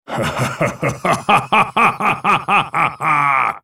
laugh; volibear

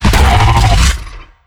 charge start; slender; attack of the radioactive thing

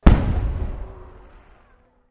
firework

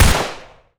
gun fire; weapons; hl2dm; alyx gun

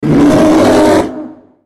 ultimate effort; Volibear

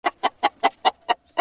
chicken idle; tf2pets; csgo chicken

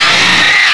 alien alert; xenomorphs

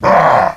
pain; bowser

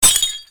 frost touch unfreeze

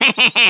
laugh; waluigi

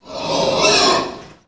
pig chase; A Machine for Pigs

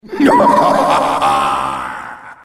gang laugh; Gangplank

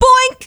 hit; freak fortress 2

